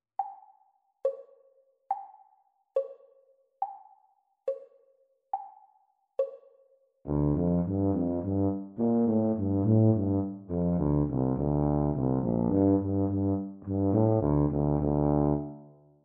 Eb Tuba Duet; Grade 7; practice speed

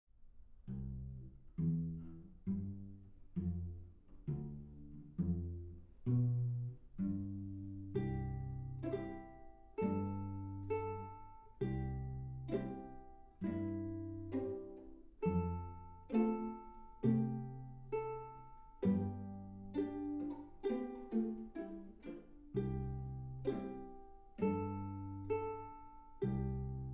with Ukulele

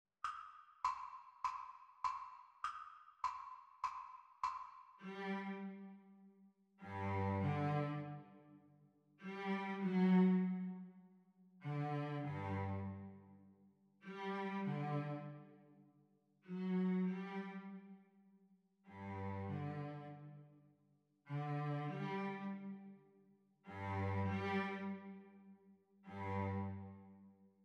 Cello Duet Playalong; Exam Speed; The Teacher part

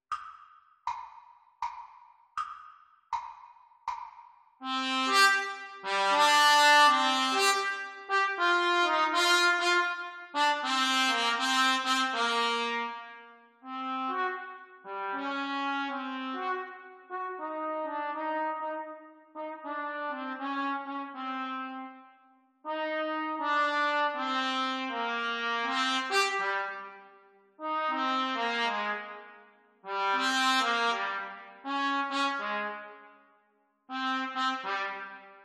Trumpet Duets; Grade 6; Practice Speed

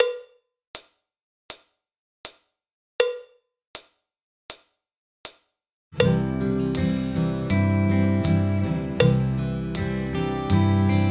Drum Kit

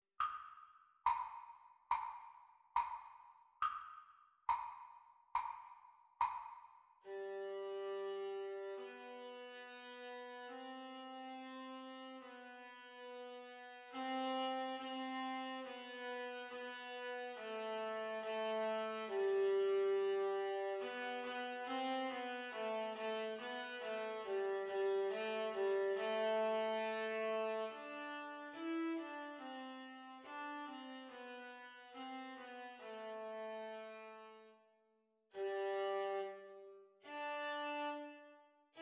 Viola Duet Playalong Practice Speed

